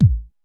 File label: Kicks